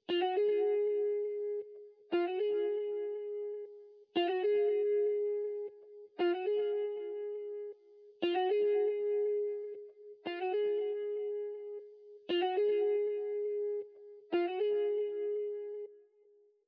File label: 118 BPM D# Min Guitar, loop stems; Cymatics; 2022 Melody Collection Platinum Expansion; Miami